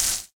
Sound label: Frying Pan; Hits